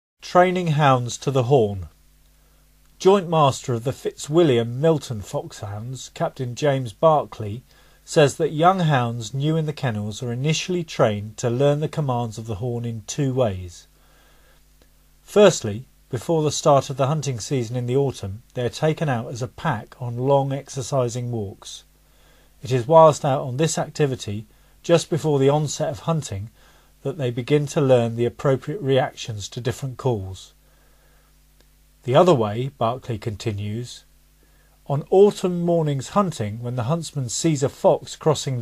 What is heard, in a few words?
TRAINING HOUNDS FOR THE HORN, calls